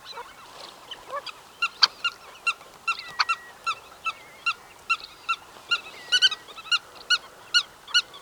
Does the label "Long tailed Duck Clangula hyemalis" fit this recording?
Yes